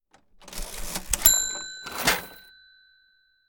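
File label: Open Close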